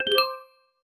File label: small win, chime